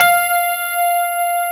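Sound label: grand piano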